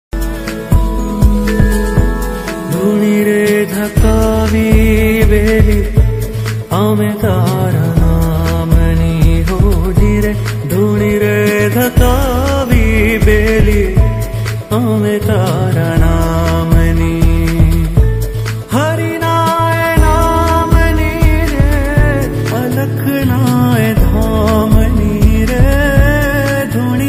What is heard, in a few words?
gujarati ringtone